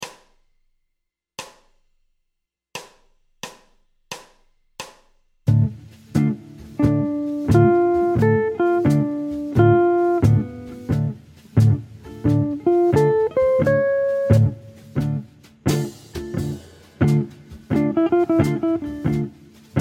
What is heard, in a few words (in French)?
Playback, guitare jazz; osons charlie christian etape 32